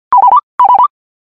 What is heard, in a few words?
Nice Short Msg Tone; meloboom